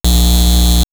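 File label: Photons, electronic fx; X-Static Goldmine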